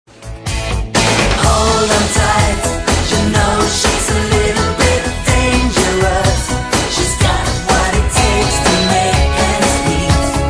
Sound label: chorus and solo